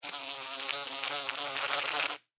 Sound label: Extermination, Bug, Zapper, Fly, Wings, Insect; Sound Effects:Animals:Insects; Blastwave